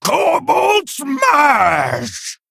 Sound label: male kobold attack